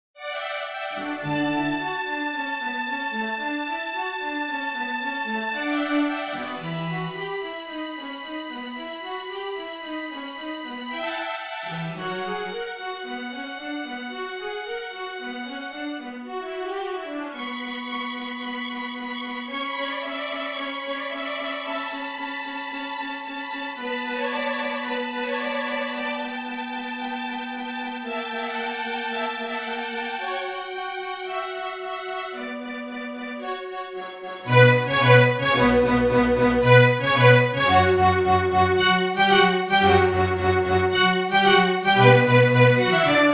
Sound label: Flute Concerto; Vivaldi; Il Gardellino